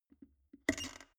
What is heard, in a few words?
plastic water boiler open lid soft